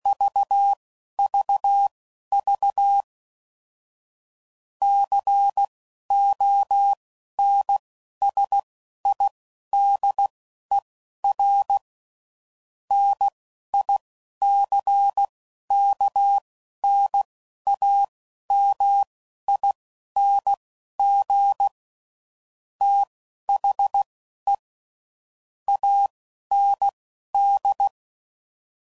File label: CW Code Groups 10WPM; HamRadio; Morse Code; Technical Subjects; AND Gate